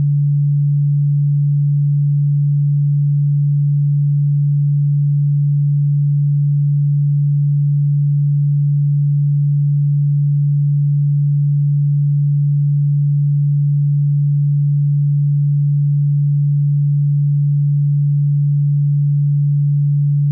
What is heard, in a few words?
tesla 144hz scalar wave